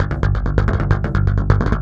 Brush, Industrial, Nasty

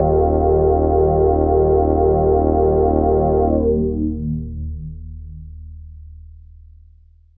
J Bass, Bass One-Shots, Drum n Bass; KJ Sawka - Drum n Bass & Beyond

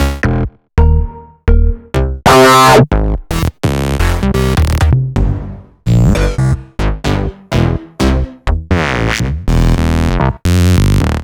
Synth Basses; Sliced

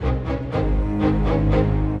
Strings; Sonic Foundry (Sony Creative Software); Orchestral VOL-2; Modern 11

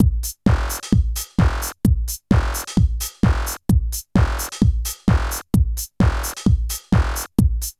TECHNO, Funky Xtreams; INIS

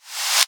Reverse FX

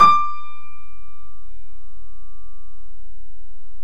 PIA GRND, Roland; Sound & Vision; Gigapack I CD 2; PIA GRAND 1

